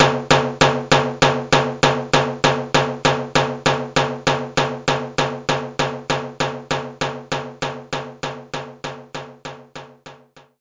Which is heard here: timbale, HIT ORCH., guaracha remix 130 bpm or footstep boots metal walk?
timbale